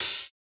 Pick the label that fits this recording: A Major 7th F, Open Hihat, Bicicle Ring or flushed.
Open Hihat